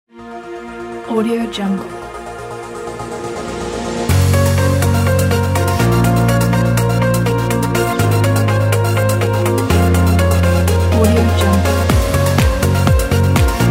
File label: tech house emotional